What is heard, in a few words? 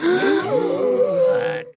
ASTONISHMENT GASPING